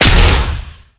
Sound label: wand of striking